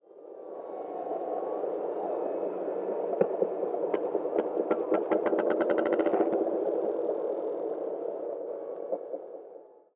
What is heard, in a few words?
echo clicks; ambient soundscapes; dota